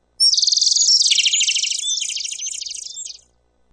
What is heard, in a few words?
Birds Chirping Short, Multiple birds chirping only a couple times.; Animals